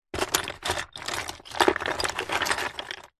drawer wooden full of loose bits and pieces rummage